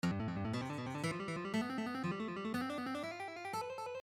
A Fast Guitar Run

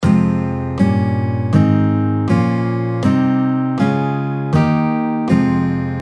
F Minor Chord Scale, guitar

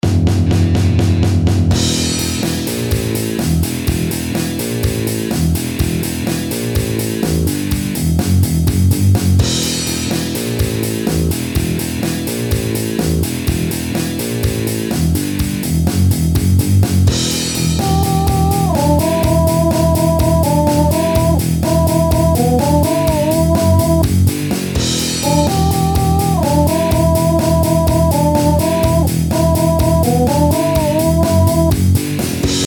Riff, guitar

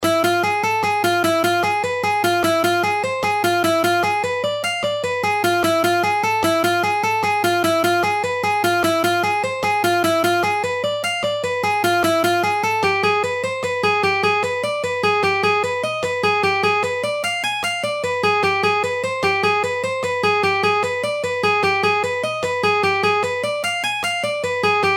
Guitar Dynamics Half Speed

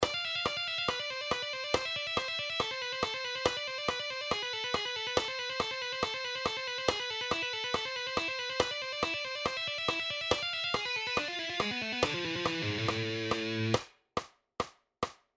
Shredding Exercise

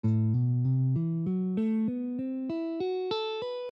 A Kumoi

